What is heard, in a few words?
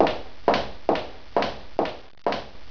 stairs; Kids' CD-ROM Bible Fun Pack; Education Publishing Concepts; NOAHDEMO